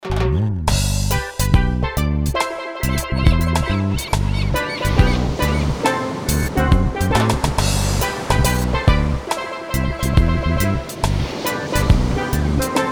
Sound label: caribbean holiday